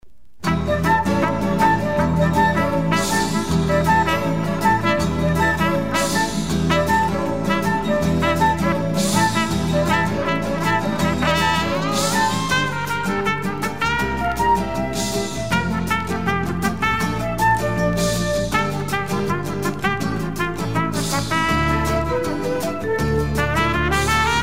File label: trompet bossa nova